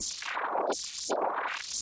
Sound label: Elect Arc Sustain, arcs & sparks